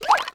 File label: slip